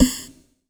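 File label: house snare drum; USB Soundscan vol.11; Drums Dance & Groove; AKAI; HOUSE KIT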